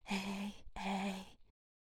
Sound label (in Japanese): 処理無しコーラス